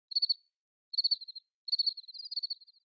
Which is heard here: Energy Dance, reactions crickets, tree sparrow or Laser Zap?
reactions crickets